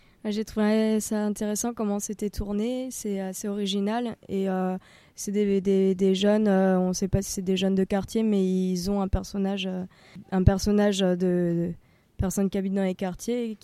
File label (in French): ressenti gong